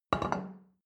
plate place table soft short, gastronomie; berufe; soundpool

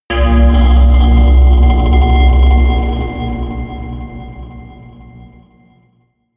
melodic anvil scrape low, drones

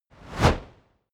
fire torch bright flutter whoosh

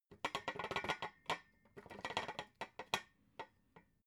metal scaffold pole rattle; industrie-wirtschaft

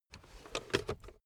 car backseat table pull up

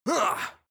male fight soft; menschen; schreie

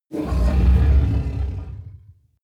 coloss growl deep; kreaturen

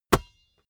basketball catch hard

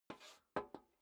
step high heels metal dry scuff; schritte; Footsteps

